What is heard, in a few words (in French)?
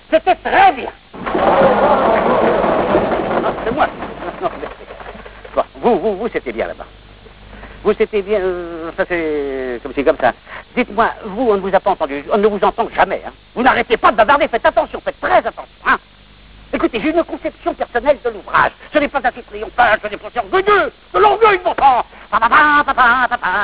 musique